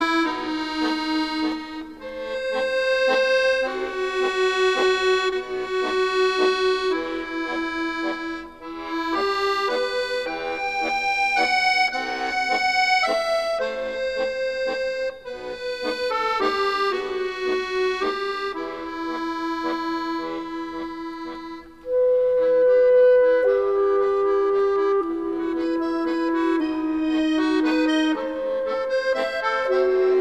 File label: music